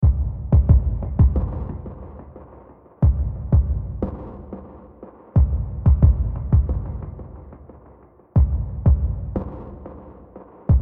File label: Deep Beat